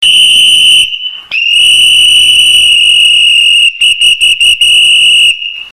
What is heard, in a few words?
thunderer